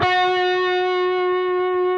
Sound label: PRS G, Guitars, LEAD